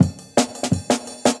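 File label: Jungle Breaks, 170 bpm; 90 s Sample CDs; Original Jungle Breaks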